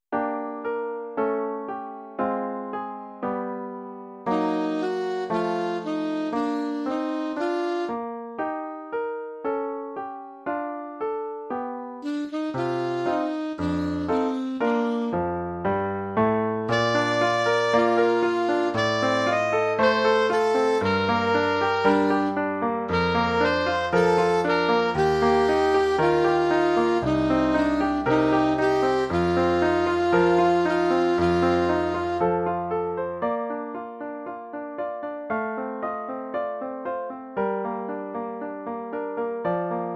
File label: Un Amour De Sax; sax alto